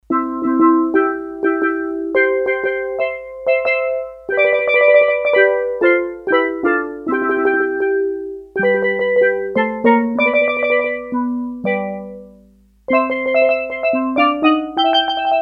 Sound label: Steel Drum, Korg DS-8; Factory Patches